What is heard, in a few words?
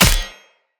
Sword Impact Flesh; Weapon Impacts; Short Sword; Combat; Local Player